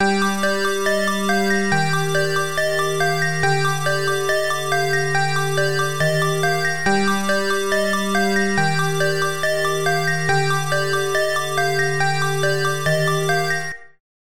lead magmatic squarewave

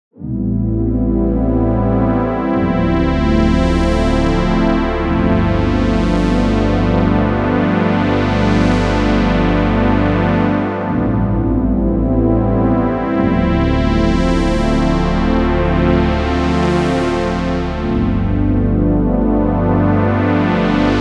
OBXa up sweeps; opx pro3

